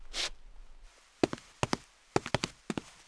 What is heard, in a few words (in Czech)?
kroky; Mafie 1